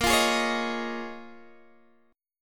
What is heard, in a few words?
A sharp Minor 11th A sharp down Guitar Open D, chord